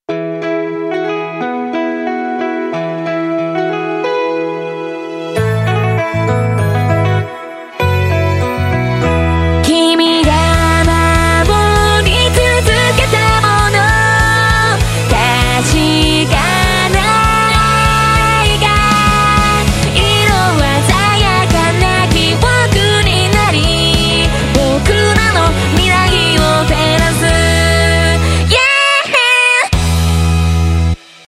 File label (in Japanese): 女性楽曲サンプル